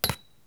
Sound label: clank